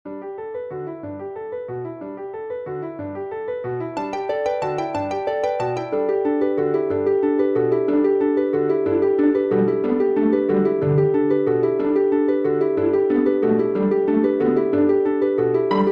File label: Quintet